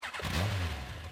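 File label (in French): demarrage orig, Yamaha XJR exhaust; akrapovic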